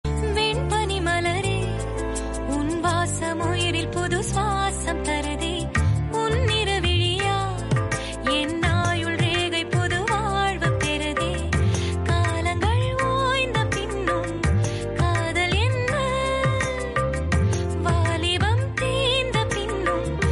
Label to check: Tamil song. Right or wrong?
Right